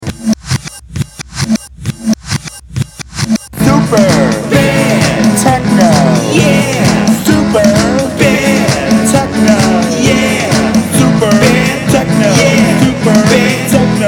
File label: techno